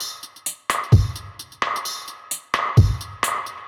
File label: Dub Drum Kit C Wet 130bpm; musicradar; Dub Drums